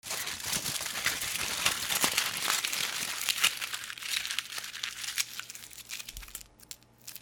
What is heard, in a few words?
BREAKING